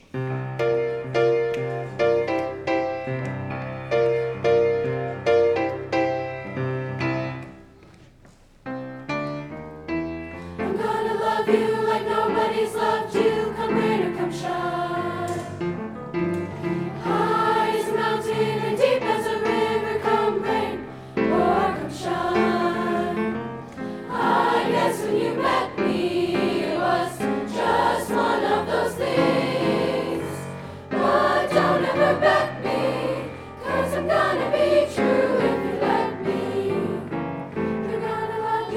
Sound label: 8th grade choir, choir concert; tfms choir concert